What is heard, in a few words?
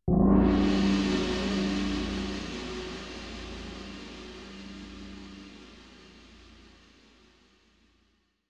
Single gong hit